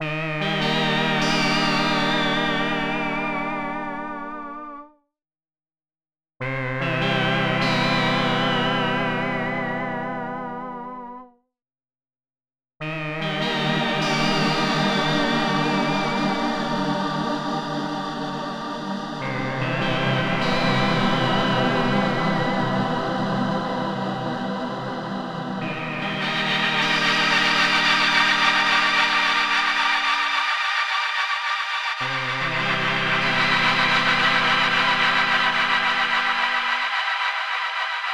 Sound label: Poly Synth; Albedo